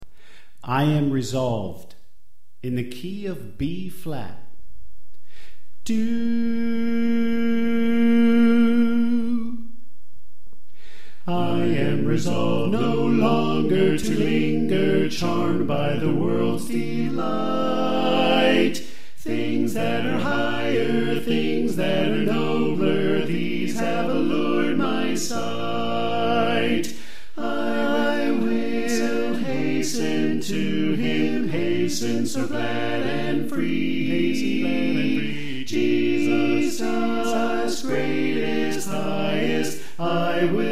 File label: Alto Left; I Am Resolved